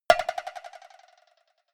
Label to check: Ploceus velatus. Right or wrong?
Wrong